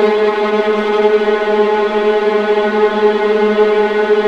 VLA G1 TRM L, VLAS TREMOLO

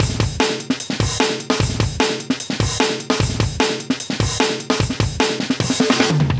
150 Almost Jungle, live breaks

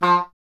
E. Horn

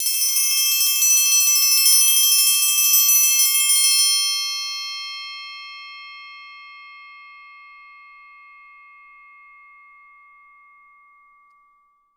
TRI TRM LO, orchestra; Best Service; Peter Siedlaczek's Advanced Orchestra

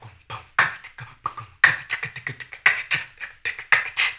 HAMBONE, Vocal Blues Soul; Spectrasonics

